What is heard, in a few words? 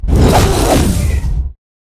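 troll buff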